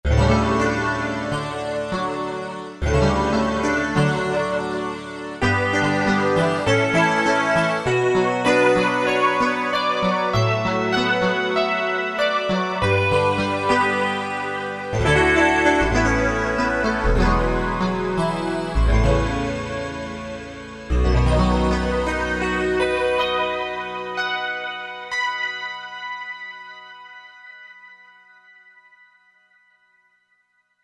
Digi PF Mix, Korg MSC-1S; Korg MSC-1S Variety

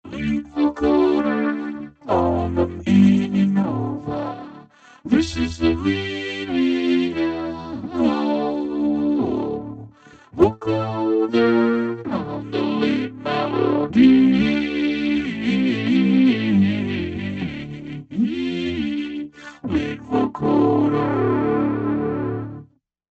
Lead Vocoder, synth

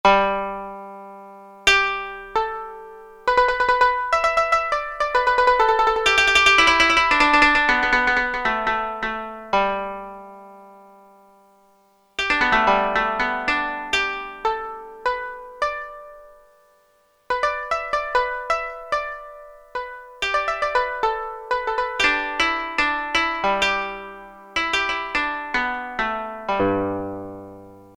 Koto 1

